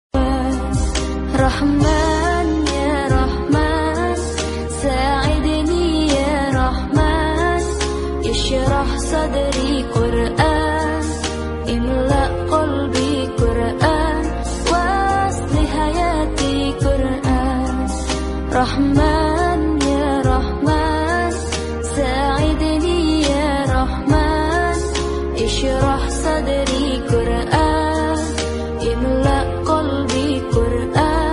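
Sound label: Female Version Arabic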